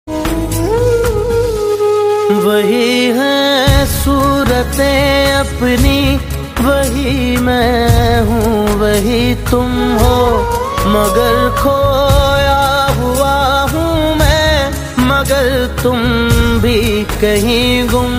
Heart Touching Song Ringtone

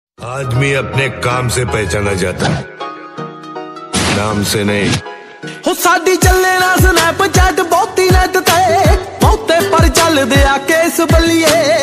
Punjabi Song Ringtone

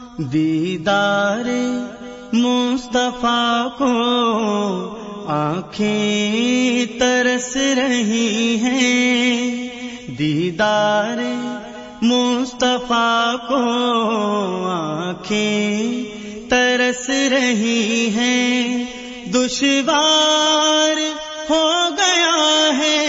Naat Ringtone

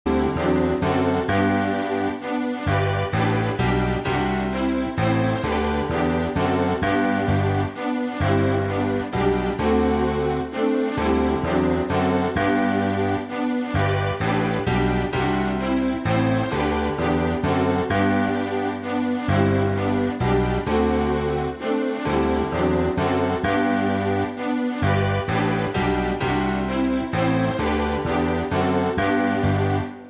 Happy Birthday With Strings Ringtone; ringtones

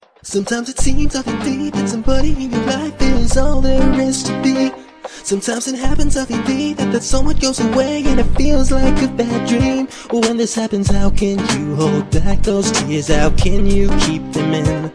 English Ringtone

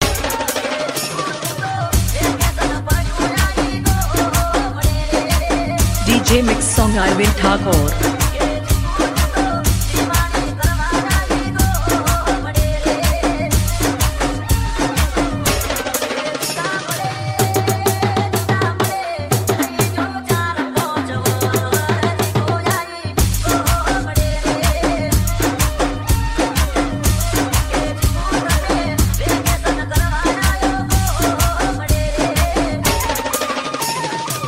Gujarati Remix Song Ringtone

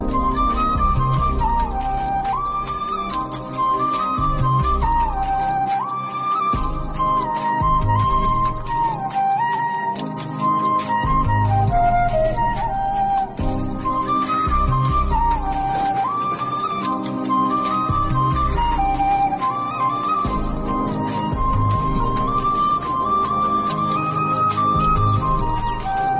Tamil BGM Ringtone; Love Today Movie

